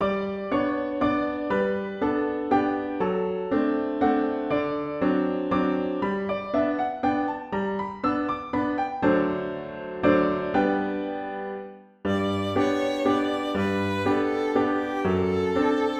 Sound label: violin; 데이지